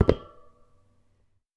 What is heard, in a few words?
Metal